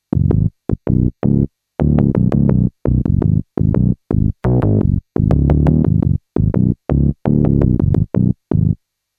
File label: Casio CZ101 deepbass; Depp bass